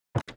reel Stop

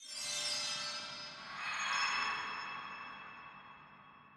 logo In; netent

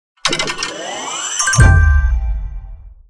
pick me extra scatter reveal; netent

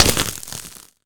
Hit Heavy Ice; netent

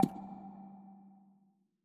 button Up

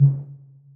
slot reel stop

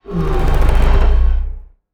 near win quick

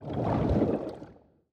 spin end; netent

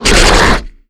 Grunt Shake Head; netent

